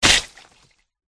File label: zombie attack contact bottom